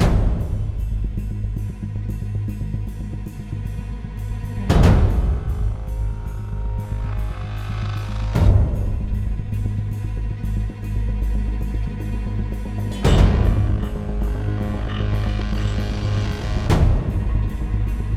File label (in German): Spannung Loop